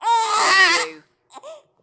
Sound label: Single Baby Hard Cry